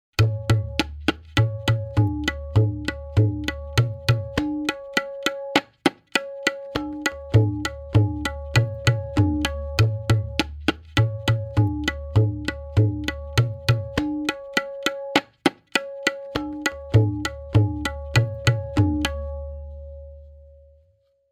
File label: Variation 10-Played, tabla; Tintal; Benares Kayda 1